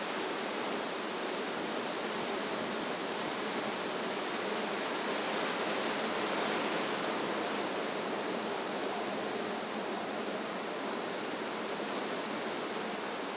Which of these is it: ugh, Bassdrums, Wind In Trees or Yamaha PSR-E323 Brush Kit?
Wind In Trees